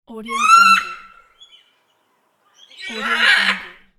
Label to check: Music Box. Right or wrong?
Wrong